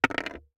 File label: DICE on Hard Wood, Throw and Roll, Metal, 1 One Die; Samples; ArticulatedSounds; DICE Sfx Party (Extended)